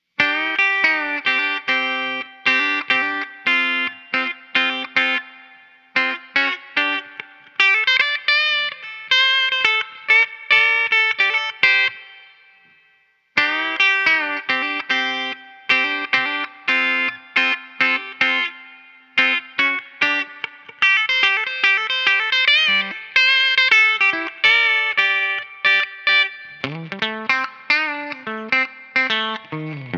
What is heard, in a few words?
G&L S500; CPR Super A